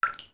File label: Beneath Well Droplet; zelda; OOT